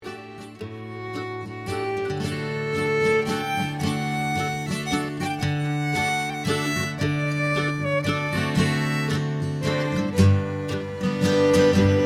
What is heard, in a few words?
String Band Westphalia Waltz; Bowdoinham; recorded at a dance